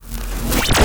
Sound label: mini uav